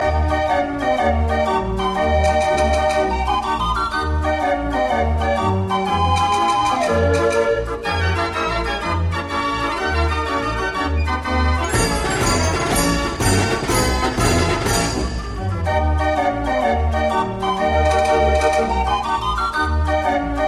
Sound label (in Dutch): Draaiorgel